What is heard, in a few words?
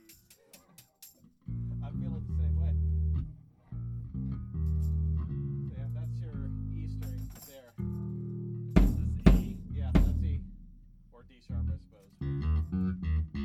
on bass, practice